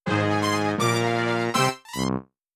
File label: end good; Rhythm Hell